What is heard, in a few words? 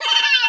Laugh